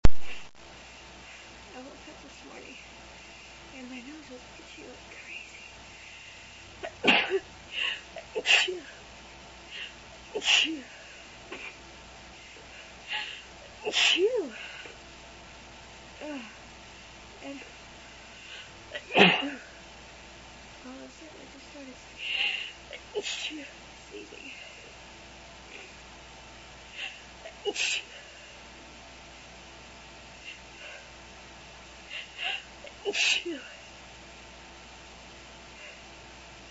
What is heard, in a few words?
morning allergies, female